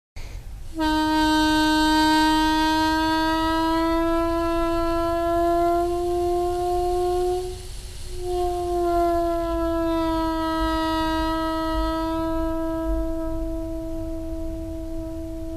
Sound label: overblow bend